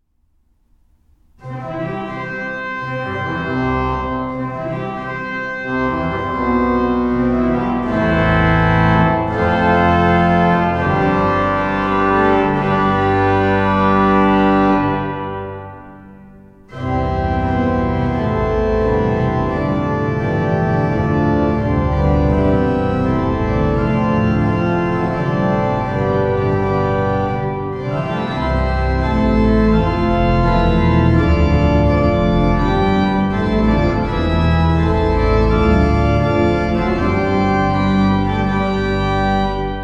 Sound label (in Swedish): Bröllopsmarsch från Vansö